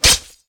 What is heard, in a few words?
Two Hand Sword Attack; Weapon